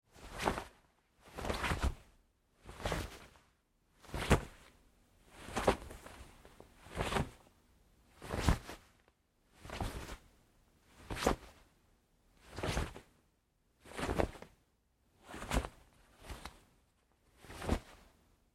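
Foley Fight Moves Pillow Case Singles Light, wrestling and struggling; Big Room Sound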